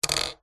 household lightbulb modern plastic base set down table; zapsplat